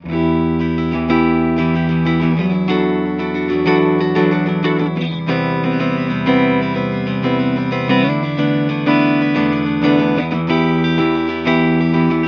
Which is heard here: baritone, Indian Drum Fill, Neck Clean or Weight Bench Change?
Neck Clean